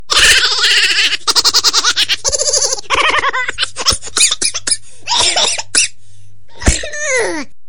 risadinha sacana

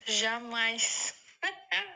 melhor risada do mundo

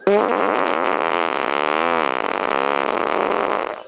peido estrondo

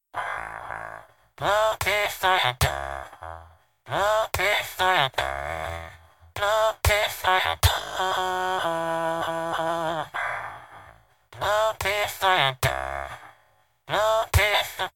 villager cantando

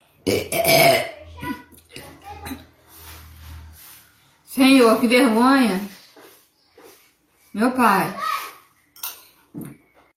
arroto; vergonhoso

